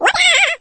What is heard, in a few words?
burn